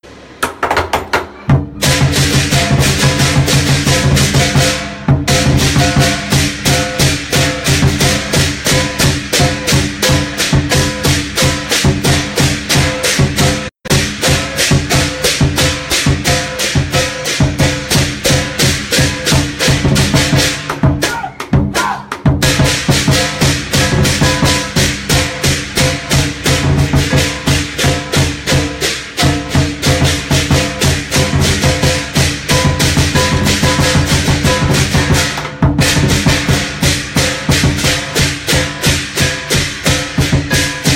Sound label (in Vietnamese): Nhac Tieng Trong Mua Lan